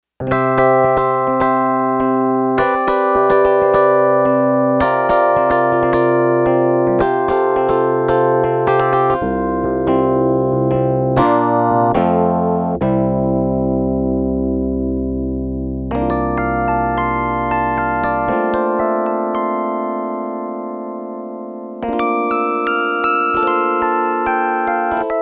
Yamaha TX802; Factory Patches; HardRoads; Voices

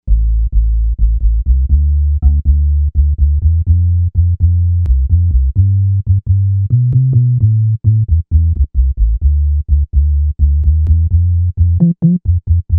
DigitalBass, Novation UltraNova; Factory Patches

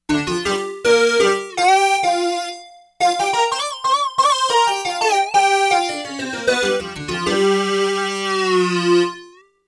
ethno lead, Korg; Korg MSC-13S Ethnic 2

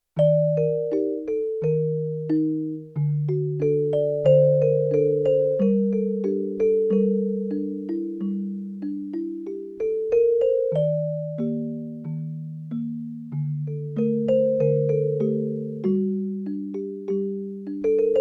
Low Celesta

